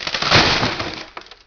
plank snap; objects; Heretic II Demo

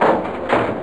close hatch; U-Boat 1.5.3

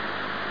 Bzzz; 8-bit Sampled Voice; 10,000 sample rate; 0.06 seconds

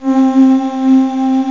Warm Pad; GMPlay; SynthPad

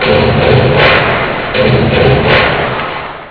Psych Crowd; Ruckus

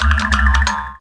country

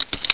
umbrella close

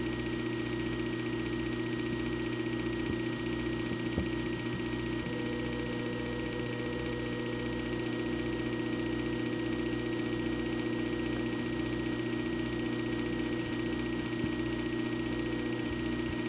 rove; explorer

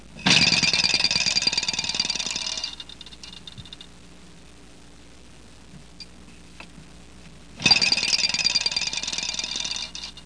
rattle; Software Explosion; Fore-Matt Home Computing

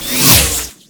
Sound speakers out; Spider-Man CD Cardz Green Goblin

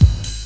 perfect loop

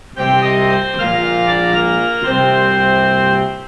Synth You Lose; Strategy; Maze; Gamma Zee

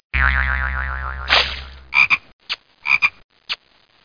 frog

